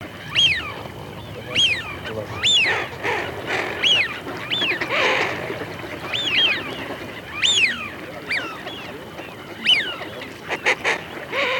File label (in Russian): Свиязь Fischione; plurifon